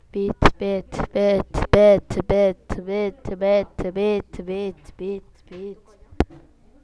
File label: beat